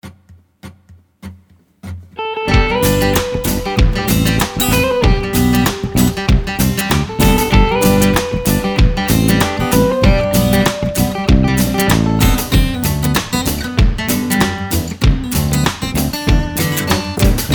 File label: AUSSIE BEACH GUITAR